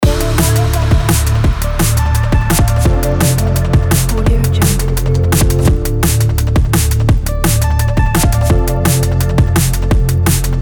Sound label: breakbeat